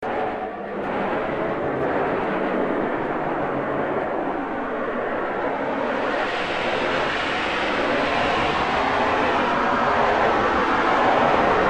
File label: Traffic Jam Symphony